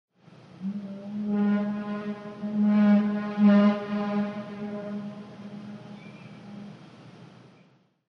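BOATS & SHIPS HORN: exterior: 3 short very distant blasts medium tone. Seagulls in background.; Queen Mary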